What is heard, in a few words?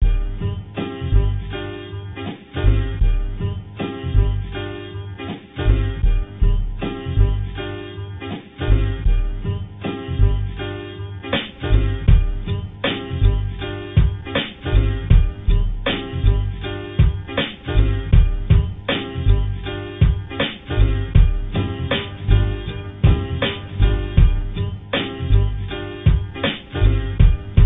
beat